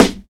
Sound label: Puffy Snare Drum Sound G Key, acoustic snare tuned to the G note; snare drum samples